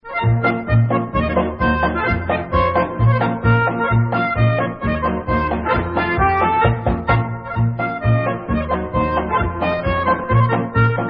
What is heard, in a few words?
Polka, Norwegian Instrumental; Polka Norwegit